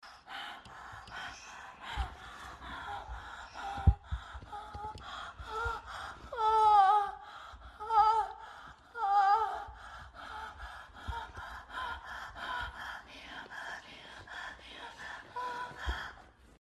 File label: sex, moans; The Witcher Hunt